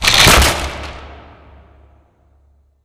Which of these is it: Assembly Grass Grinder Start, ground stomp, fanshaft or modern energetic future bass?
Assembly Grass Grinder Start